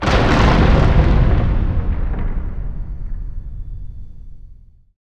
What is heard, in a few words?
STR SNAP, String FX; Roland - LA Composer vol. 2